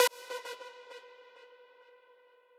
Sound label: lead